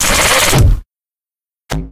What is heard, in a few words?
deploy small; video games; source engine; tf; mvm